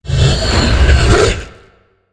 alert, dragon

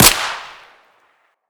1911 suppressed fire; weapons

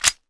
ots33 slide release

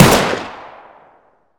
fire, weapons

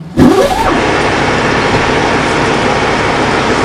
turn on, vehicle; frghtlnrargosy; tdmcars

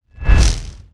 shock fail

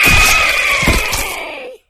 flesh destroyed large; flood

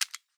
m4 forward assist, weapons

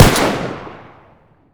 sks fire

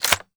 g36c bolt back; weapons

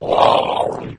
leap, flood

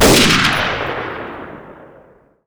k98 shoot; weapons

